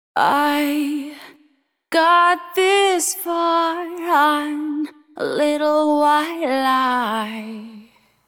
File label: Vocals DRY